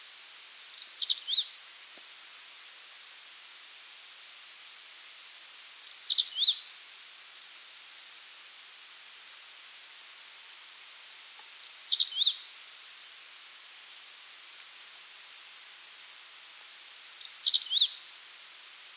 Rock Bunting, Zippammer

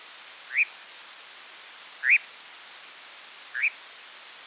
European Nightjar - call, Ziegenmelker

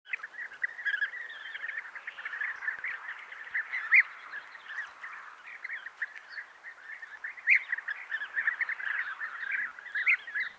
European Bee-eater, Bienenfresser

